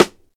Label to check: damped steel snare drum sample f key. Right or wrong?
Right